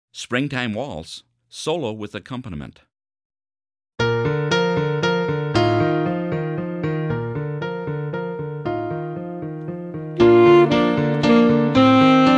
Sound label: Waltz Solo and Accomp; Springtime